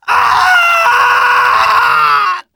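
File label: Scout pain critical death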